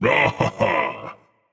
nv discover ah; smite; voicepack; ganesha

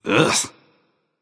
ugh; smite; heimdallr

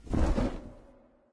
wingflap; smite; thanatos

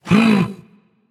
nv breath; smite; voicepack; ganesha

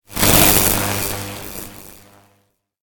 projectile; smite; god raijin

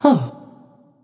surprise; smite; voicepack; medusa

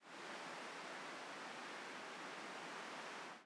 Defeat Wind; Smite; Susano

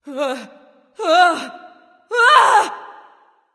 fear; smite; awilix

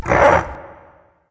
nv grunt lift; cernunnos

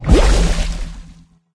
victory water enter; Smite; Sol

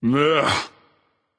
"ugh"; smite; voicepack; poseidon

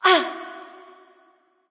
grunt hurt; smite; kali

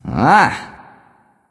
"ugh"; smite; voicepack; sylvanus

